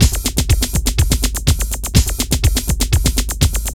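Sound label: drum loops, right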